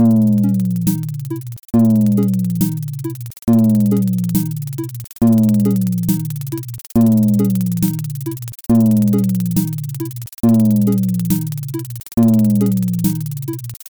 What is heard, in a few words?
beat balkan trap